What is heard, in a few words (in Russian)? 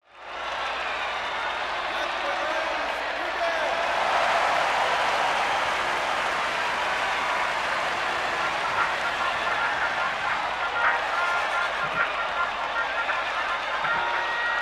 ricarskij poedinok na loshadyah vozglasi bolelshikov